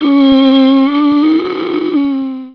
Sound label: zombie die, male